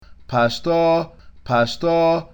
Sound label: poshtah, cantilation